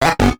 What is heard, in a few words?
Disconnect; Stack 'Em High; 3DS